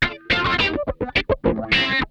RAW, Funk Guitar; 90 s Sample CDs; Zero G

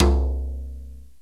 Surdo open, Room

